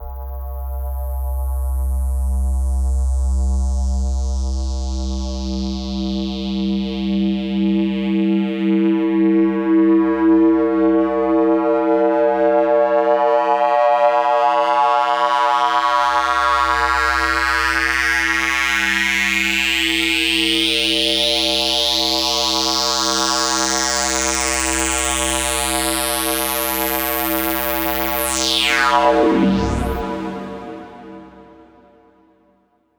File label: Sweeps Long